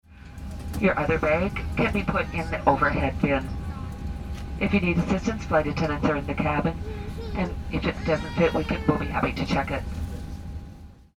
overhead bin

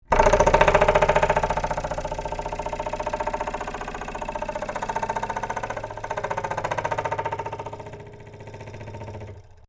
door stop

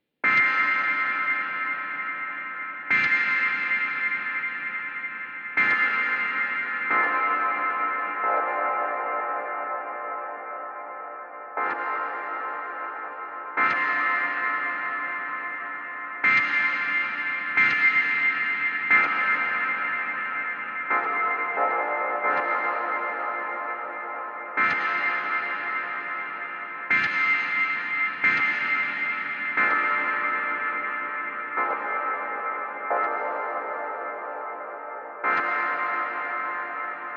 micro sample with massive reverb

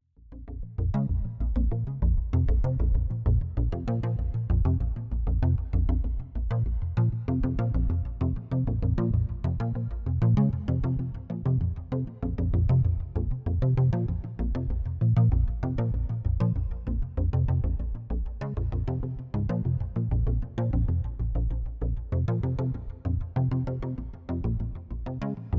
BS3X Arpeggio at 97 BPM

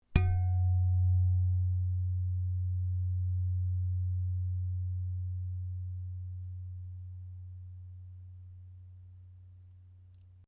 surly 1x1 rigid front fork

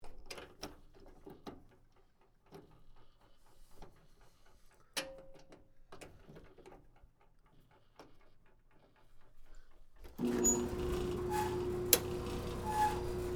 squeaky drier

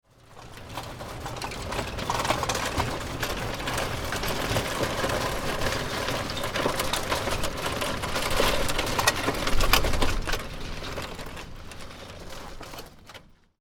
rolling carts

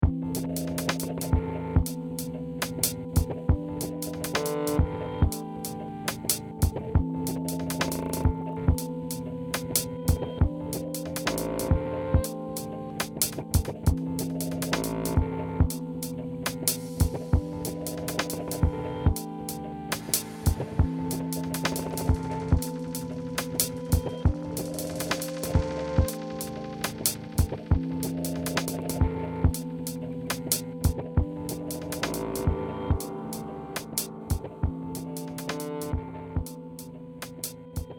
rhodes pedal noise

